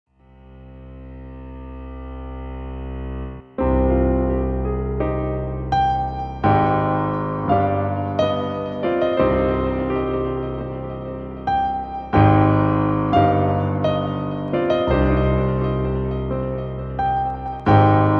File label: battle scene